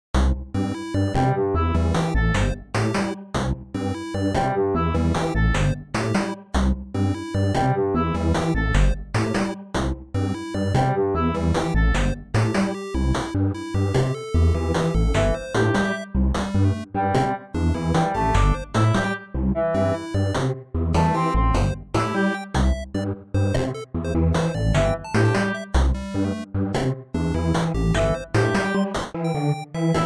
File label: techno